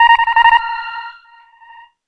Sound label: bounds alert; allegiance